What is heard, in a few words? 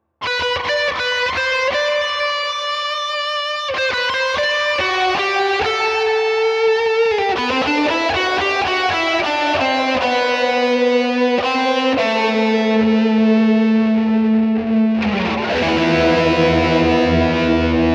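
Headrush Synth Drone Lead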